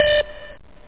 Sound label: Casio bleep